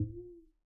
Conga Thumb; Roland BK-5; Percussion

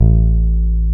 BASS A1, Electric Basses; PlatinumPhattCD2; E-MU Producer Series Vol. 8 – Platinum Phatt

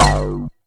Iron Bass; Yamaha DTXpress; Effect

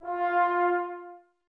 BRS TBS F, Woodwind Brass Ensemble, Brass; Miroslav Vitous - Woodwind Brass Ensemble

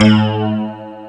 SYNTHBASS; East West - Global Sounds

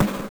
Tom, Genesis; Sonic Spinball; M8

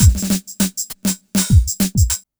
drbreak, drumbreaks; Masterbits; Vinyl Attack

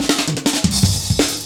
Heavy Live B, Drum Loops, Jungle

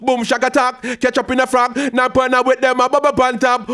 C PHRASE, RAGGA IN C

